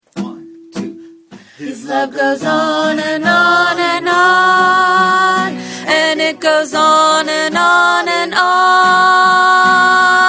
Alto

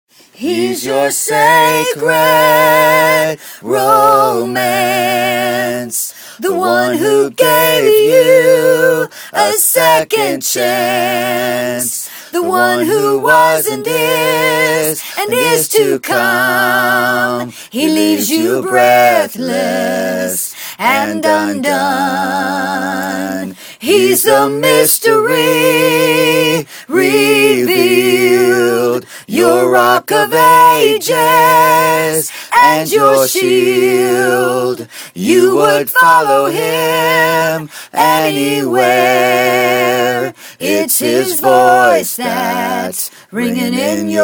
tenor

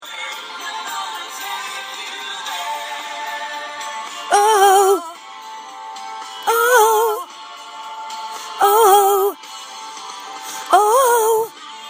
Oooh 3rd above

